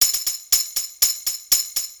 Tambourine Loop; Chipmonk; macOS; PS4; Windows; Xbox One; Xbox Series X/S

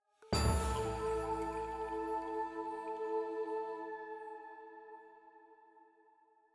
item Jingle Full+ Choir Flute A

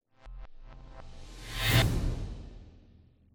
Level Start Sting

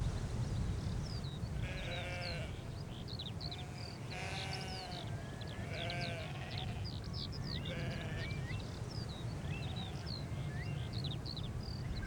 TRAINLINE EXT

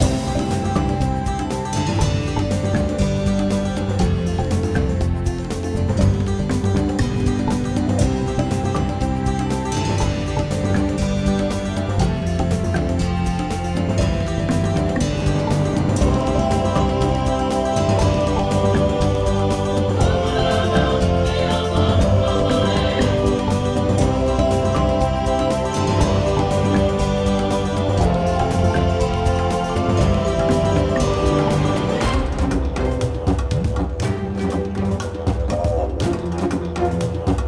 Menu Loop; rakoo friends wii u 2015

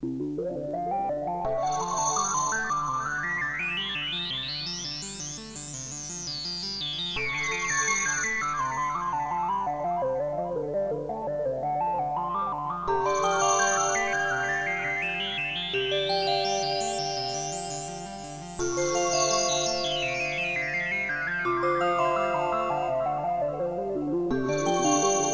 Engineering Deck Techno Bass; startopia

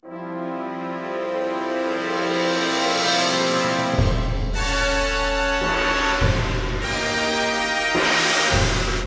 Action START; bolt 2008 pc gamerip

